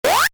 Maximise, Effect To Accompany Maximizing Of Window Or Program; Transitions; Multimedia